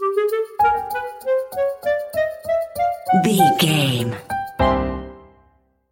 comical moments stinger, silly, circus, goofy, comical; innocent; naive; child like; childish; Sitcom; Dramedy